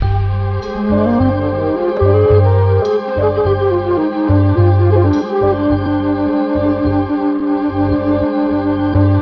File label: flute